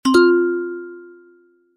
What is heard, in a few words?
drumroll tadaa